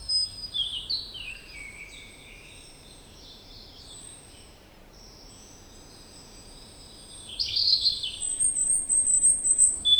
forest sounds; leaves

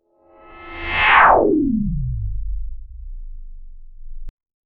a clean quiet sweep whoosh

